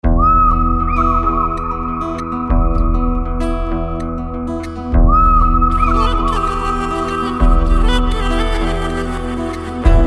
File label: Instrumental; Tamil; Andhra Mess (2015)